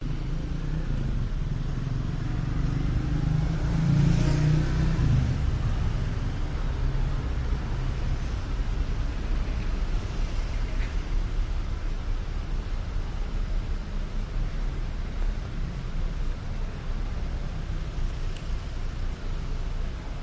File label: athens side streets weekday evening; veikou; your free sounds